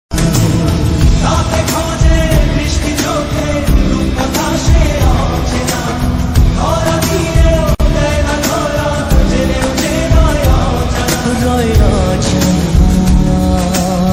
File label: bengali ringtone